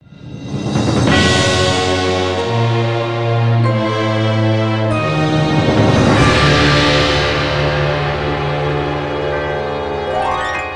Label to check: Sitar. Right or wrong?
Right